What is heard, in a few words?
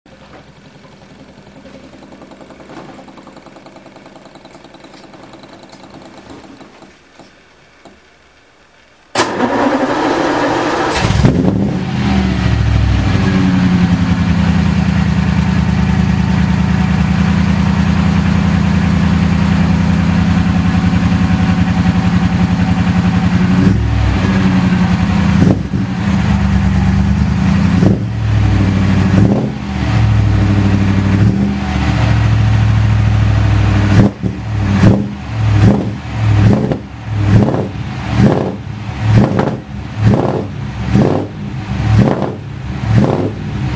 DART ENGINE